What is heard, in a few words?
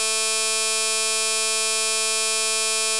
220.5 periodic noise